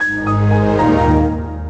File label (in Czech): windows xp vypnuti